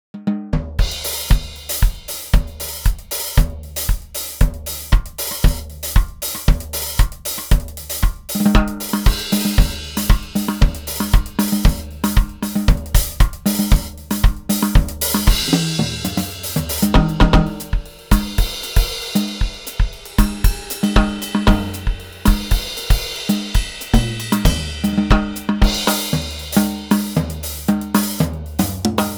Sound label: efnote5 snare off